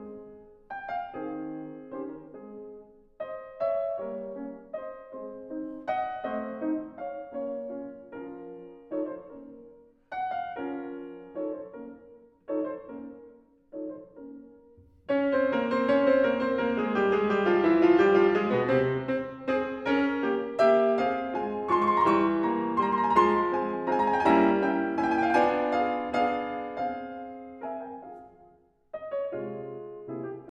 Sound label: Beethoven Sonata in F Minor Op. 2 No. 1 Menuetto Allegretto